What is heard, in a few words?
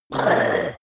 leprechaun gasp; koerpergeraeusche; comedy-cartoon; soundpool